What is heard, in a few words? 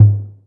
Tom Lo Dark, 808 Toms; Slate; Vintage Drum Machines Volume 2 808 Edition